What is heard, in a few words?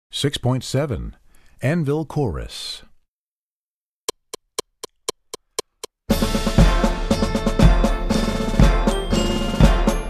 Percussion; Anvil Chorus; Percussion Book 1